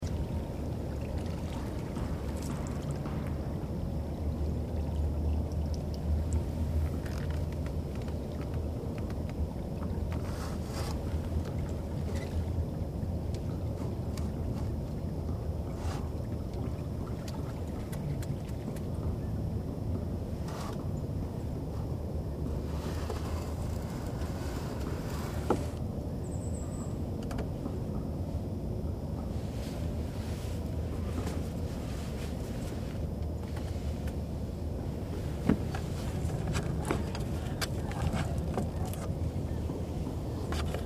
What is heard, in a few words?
hammering